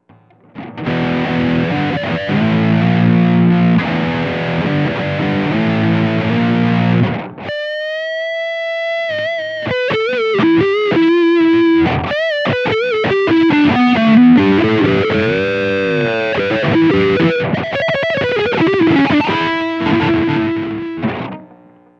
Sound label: Vint. 12, Boss GT-8; R-FIER